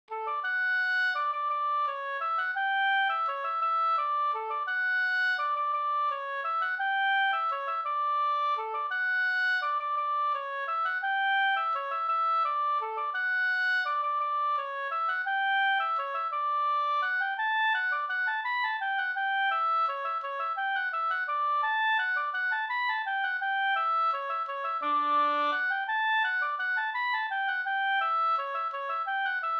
vals Norskvals

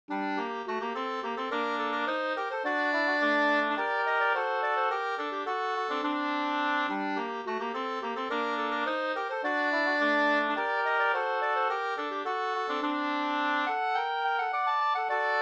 polska

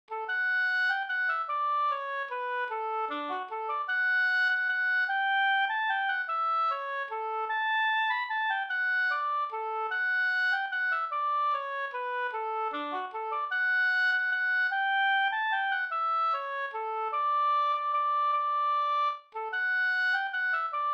vals Brudvals; av Hilding Andersson

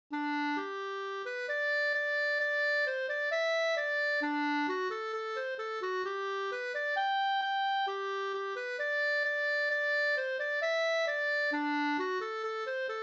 schottis lybeckare

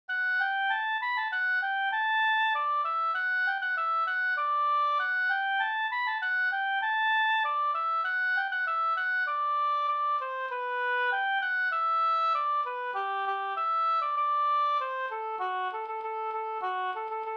ganglat Marsch

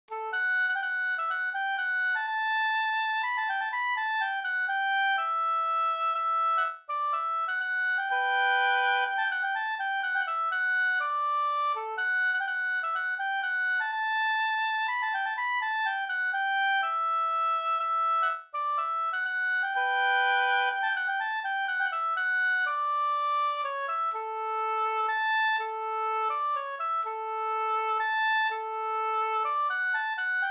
hamburska

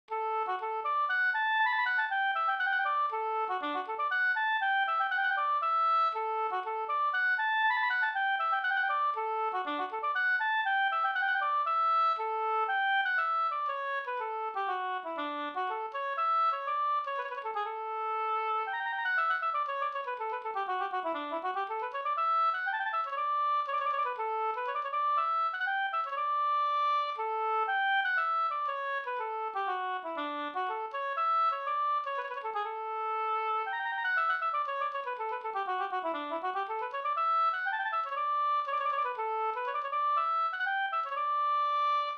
polska Bingsjopolska; Blarocken e Pekkos Helmer

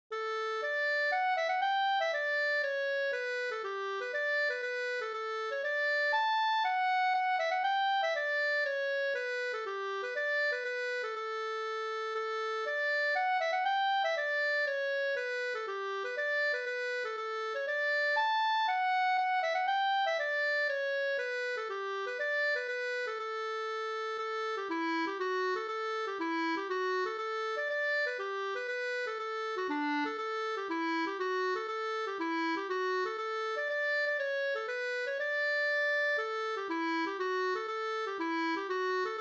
polska Bodapolska

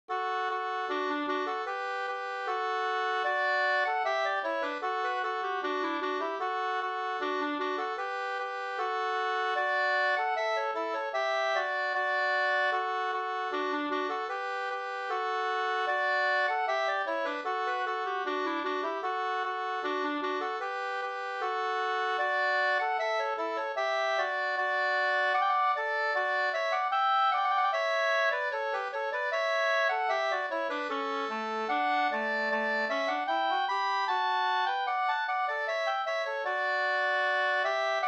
brudmarsch Ridmarsch

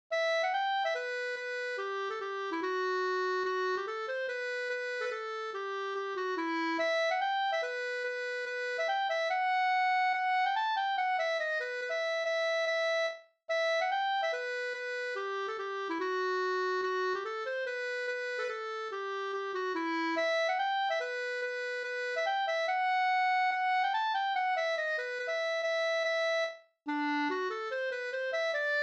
schottis

